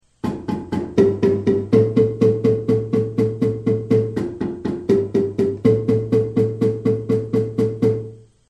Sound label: bass pre chorus, marimba